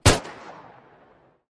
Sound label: Gun Sound; Gangstar; Gully Loops